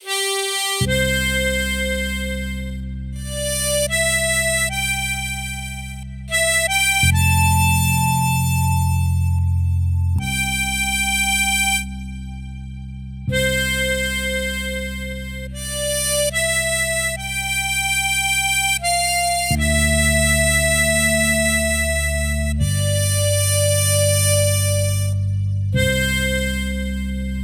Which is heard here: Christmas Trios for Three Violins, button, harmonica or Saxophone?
harmonica